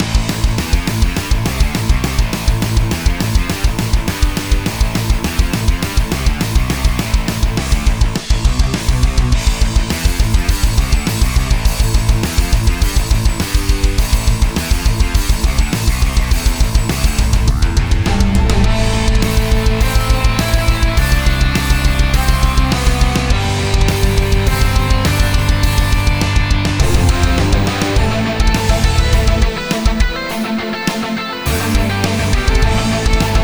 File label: boss battle